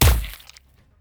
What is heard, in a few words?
airship vibe